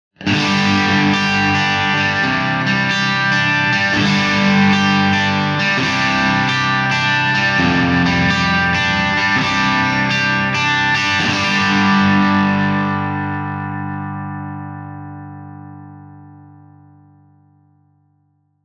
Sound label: Micro Dark Country Rock Crunch